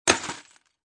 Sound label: Cut Ice; Ice Breaker Online